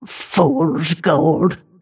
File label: last hit pain; snapfire